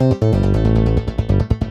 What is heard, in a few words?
SNTH BASS ELCTR 140 A, Techno